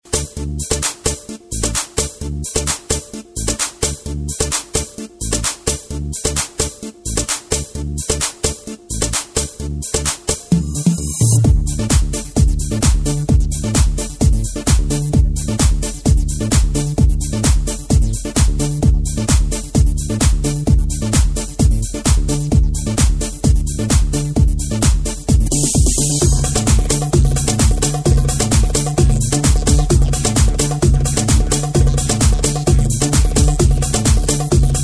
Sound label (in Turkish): pop, roland gw8 pop ritim